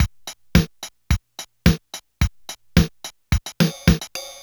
Casio MT-600 Rock 1

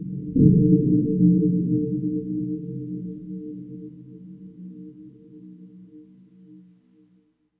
E-Mu Proteus FX Infinite

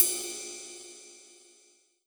Roland SC 88 Ride Cymbal

